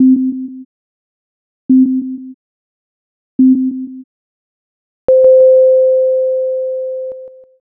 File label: jiho